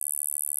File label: Gauss Filt D2